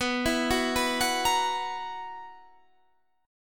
B Major 7th Suspended 4th B down Guitar Standard; BM7sus4; arpeggiated

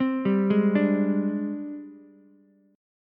Ab Major 7th Flat 5th Ab, down, Ukulele; arpeggiated; JGuitar

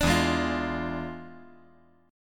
E 7th Flat 9th, chord

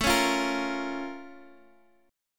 Bb Minor Major 7th, A

